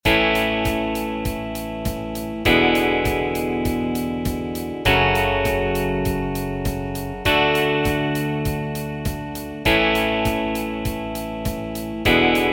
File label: Country Full; MASCE Tryout track; MMEA All-State Collective Ensemble